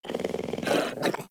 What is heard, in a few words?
Puppy Interact Wake; Vocals; Warframe; Kubrow